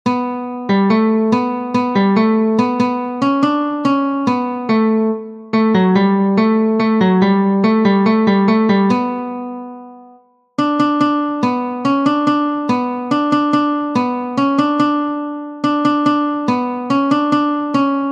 guitar

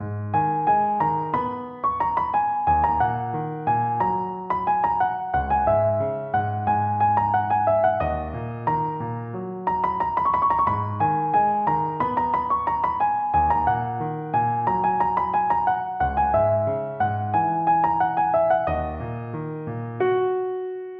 Piano

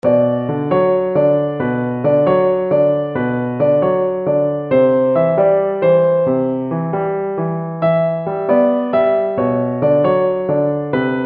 piano

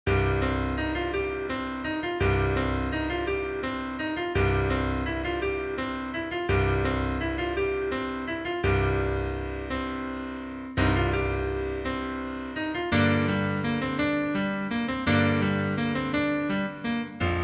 Easy piano

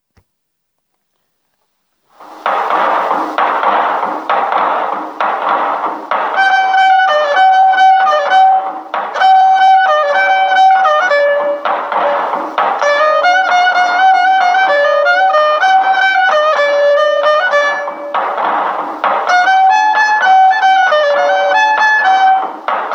ritm long ashayeri

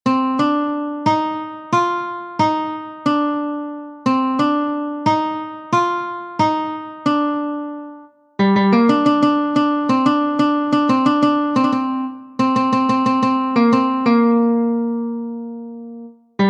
gtr

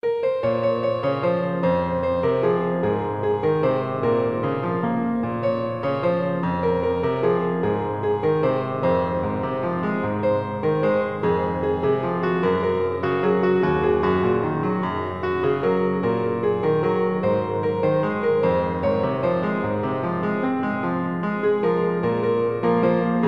piano

